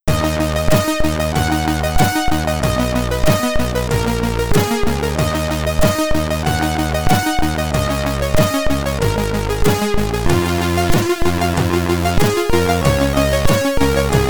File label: Loading Tune; Myth; Commodore 64